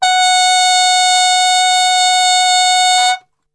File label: F#3, Brass, TRP CUP MUTE; Best Service; Brass Super Section; 90 s Sample CDs